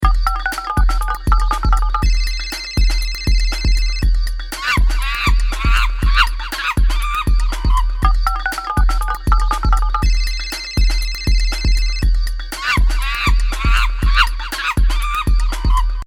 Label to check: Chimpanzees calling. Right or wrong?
Right